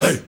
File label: "HEY HEY", Heart of Africa, shout; Spectrasonics; Heart of Africa 1; CH. SHOUT2